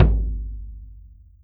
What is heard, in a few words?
Low Tom; drumthumper; oboe